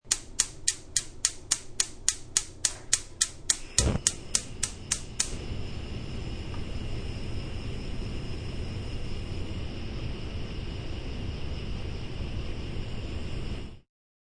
Lighting a Stovetop Burner, Clicks of stovetop burner lighter with flame; Kitchen Sound Effects; Household